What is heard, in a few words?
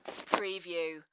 Cloth Wipe Fabric